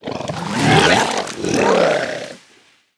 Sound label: Corpse Flower Play; Sherazin